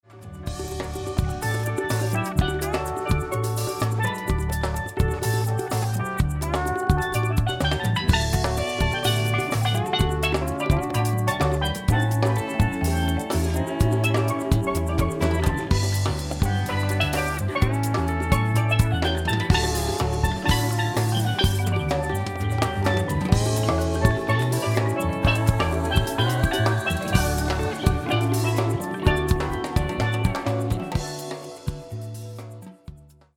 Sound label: Steel Drum